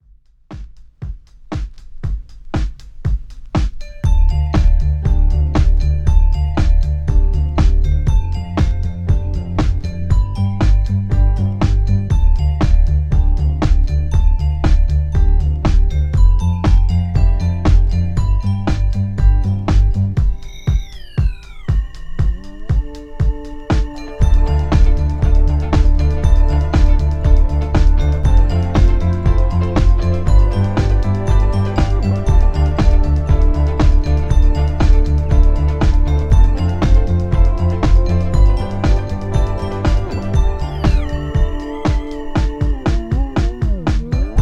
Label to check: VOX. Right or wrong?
Wrong